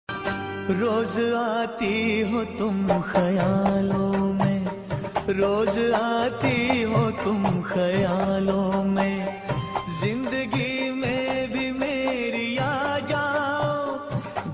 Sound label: hindi ringtone